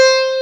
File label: SITAR C5; Big Fish Audio; Synth City